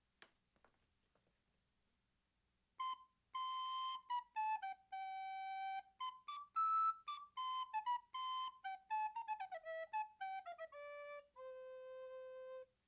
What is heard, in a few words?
recorder sample